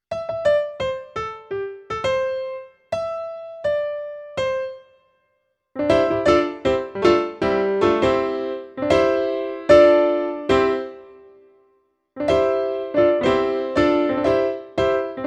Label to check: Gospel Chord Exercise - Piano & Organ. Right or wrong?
Right